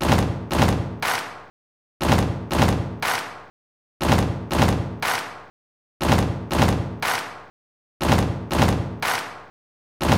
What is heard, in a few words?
stomp stomp clap loop